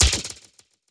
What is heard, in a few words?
down shakers; gonner linux macos ps4 switch windows xbox one gamerip 2016